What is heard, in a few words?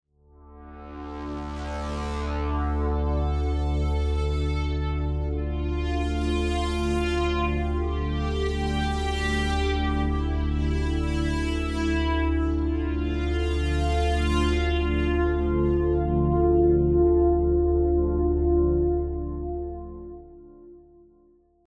Kaox FM Pad